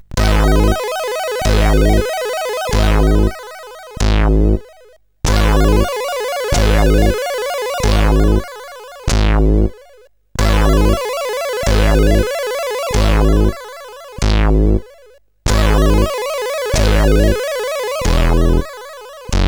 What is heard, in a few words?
Small Intro Tune sid CSG8580R5